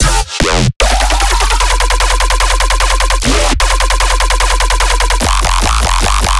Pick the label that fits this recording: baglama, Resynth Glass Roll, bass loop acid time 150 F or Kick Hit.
bass loop acid time 150 F